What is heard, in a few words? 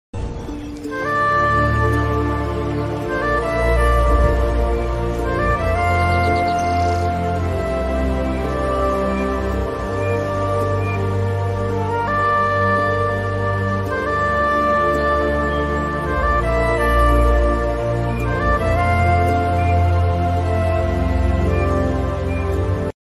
krishna flute music flute music krishna flute music flute music